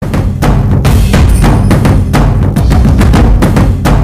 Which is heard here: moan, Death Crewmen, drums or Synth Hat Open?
drums